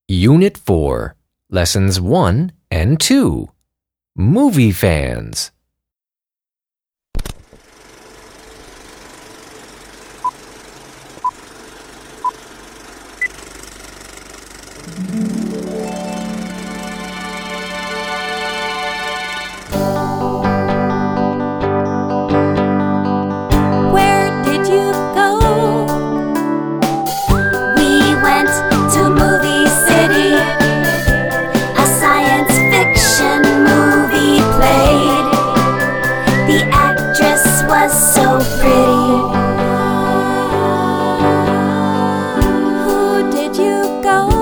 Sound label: Song Movie Fans; Student Book 6